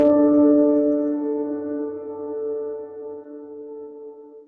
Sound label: correct